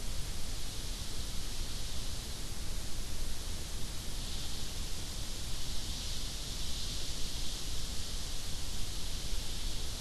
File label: WFR audio emfisis